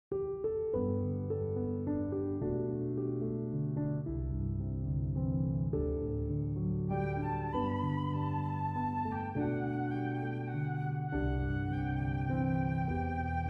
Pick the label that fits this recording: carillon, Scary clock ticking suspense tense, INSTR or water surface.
INSTR